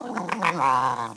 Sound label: female cry; Star Wars Obi-Wan; XBOX